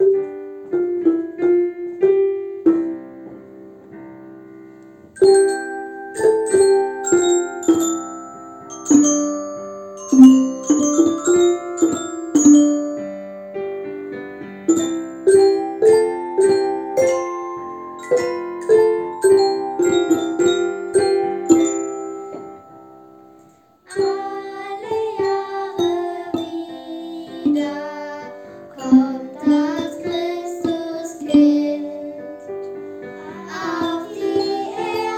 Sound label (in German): Alle Jahre wieder OGS Chor Schulchor Instrumentalkreis